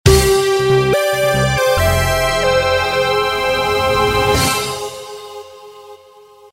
level win; fast striker; ps vita

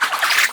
spin object short

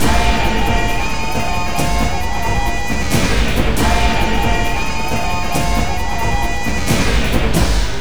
Rythme; Odyssey: The Search for Ulysses

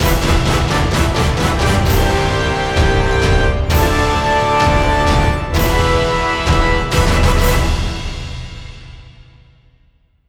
Battle Cleared; mario rabbids kingdom battle the complete gamerip switch gamerip 2017; Dungeon Dispute

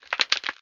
Solidify

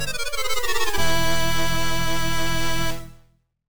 Dying; super star wars pc; ibm pc gamerip 1995

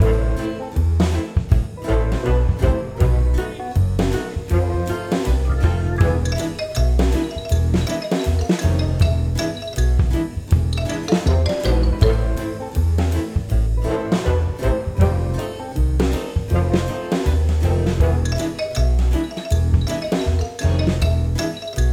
skeleton band loop; TinyKeep

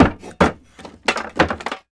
Jumble Tools

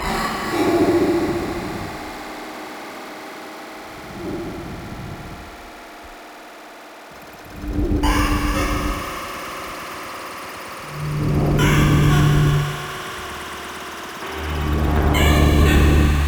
Music loop; Ch04 TheFamiliar; Arca's Path VR

